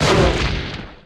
stomp light; gmod; lvs star wars; atte

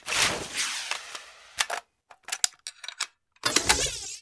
wpn lg reload; empire

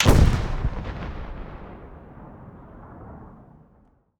explosions; gmod; lvs framework

